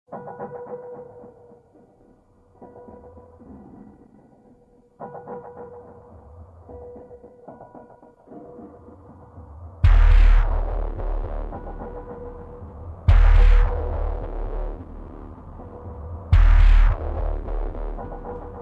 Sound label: ambience, zombie plague